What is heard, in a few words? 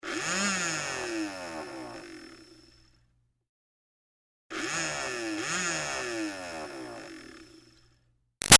Toy Bubble Machine Short Steady; Miscellaneous Toy; Toys; Big Room Sound